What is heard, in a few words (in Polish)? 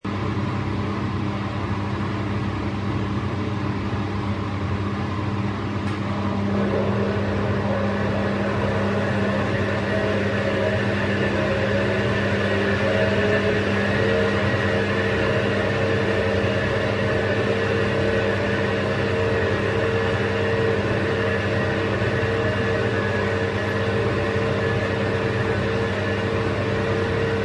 Pralka; Chomik Heniek Wielka Wyprawa